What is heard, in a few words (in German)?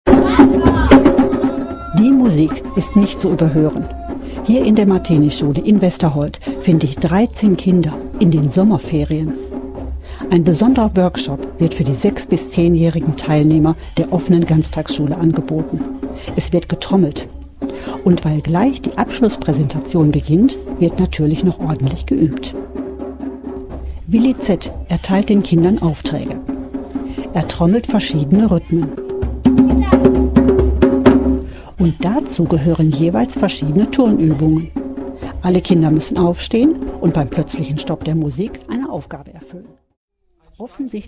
Trommel